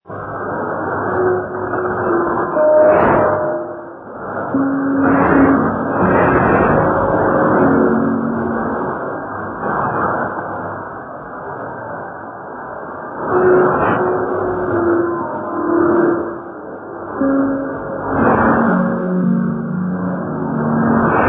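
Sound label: radio, 3266 kHz; RRI Gorontalo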